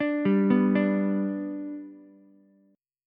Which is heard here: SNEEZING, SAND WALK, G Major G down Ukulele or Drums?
G Major G down Ukulele